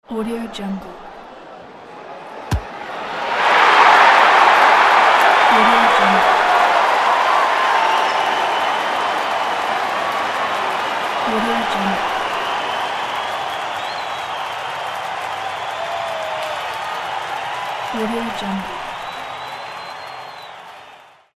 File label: football goal